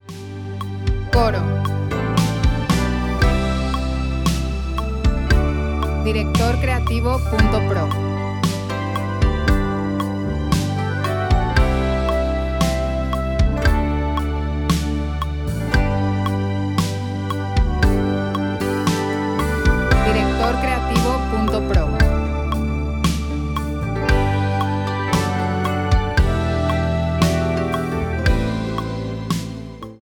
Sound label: 115Bpm 4 4 G